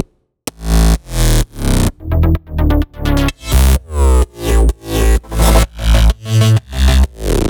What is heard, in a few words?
Economic Melody Root A, 128BPM; Vengeance Samples; Economic Kit